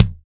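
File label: spunky kick; NATUR. K1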